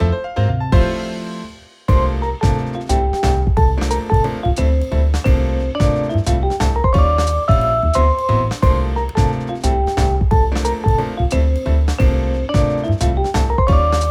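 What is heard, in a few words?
BGM Trump Table Round Result Win; Clubhouse Games: 51 Worldwide Classics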